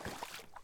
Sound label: water, Footsteps